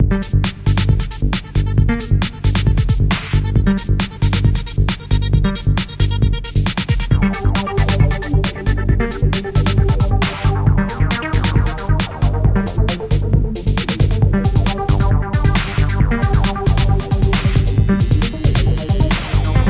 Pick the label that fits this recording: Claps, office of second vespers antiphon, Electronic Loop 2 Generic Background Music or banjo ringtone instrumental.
Electronic Loop 2 Generic Background Music